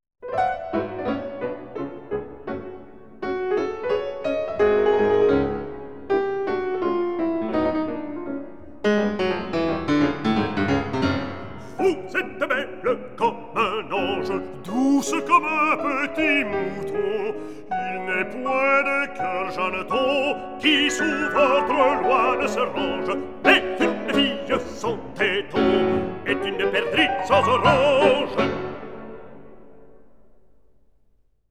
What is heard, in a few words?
song cycle for voice & piano; Chansons gaillardes; 3. Madrigal